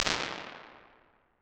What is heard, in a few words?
concert; quantec